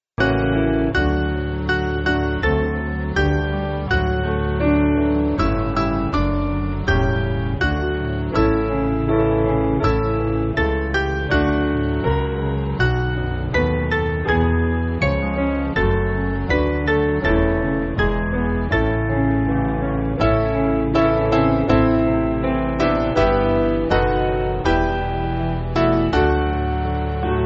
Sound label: Piano